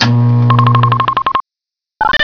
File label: ret blip